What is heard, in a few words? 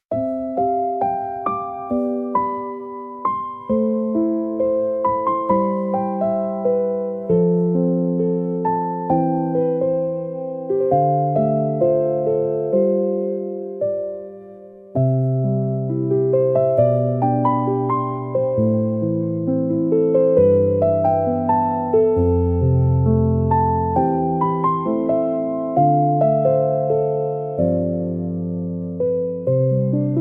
fantasy music nature forest